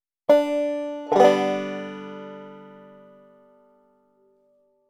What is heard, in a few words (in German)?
grundanschlag